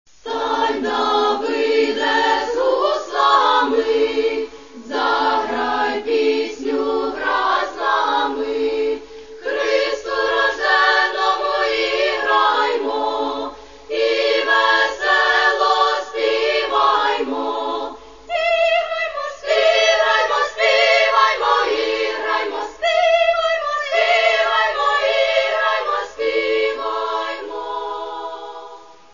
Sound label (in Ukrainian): koljadky i shchedrivky